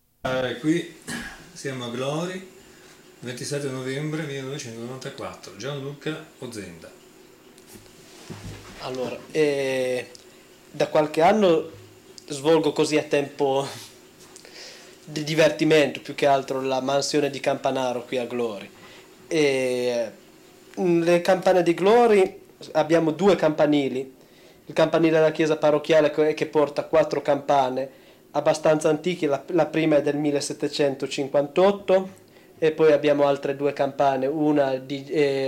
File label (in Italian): Campane, Glori; Imperia; Liguria; Archivio Balma Campane Liguria; Polifonia